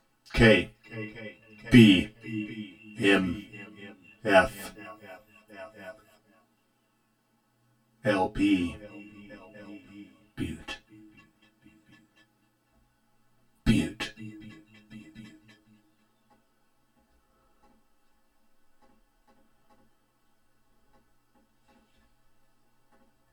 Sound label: Ambient; STATION IDs